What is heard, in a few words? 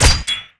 fire, crossbow; weapons; Sourceforts2.0